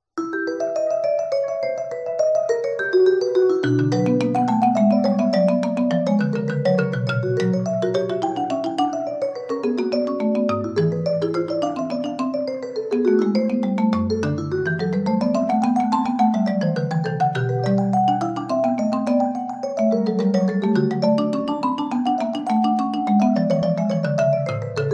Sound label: BWV 855 Wohltemperiert Fuge E Moll, Mallets; Vibraphon und Marimba; Bach for Mallets; Fuga Nr. 10 E Moll